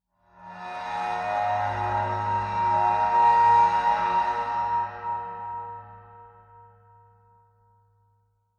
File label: cymbal high, ambient; cymbals